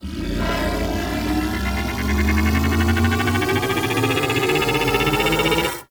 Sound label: Ship Orbital Strike Cannon Charge; Gameplay; Ambience; Warframe; Corpus Ship Remaster